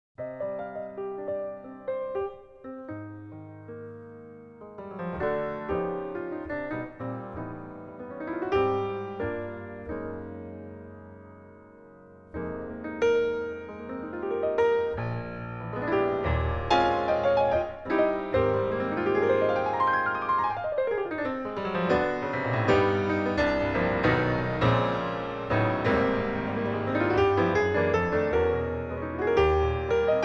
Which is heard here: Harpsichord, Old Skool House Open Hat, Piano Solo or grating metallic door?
Piano Solo